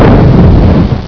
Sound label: brik hit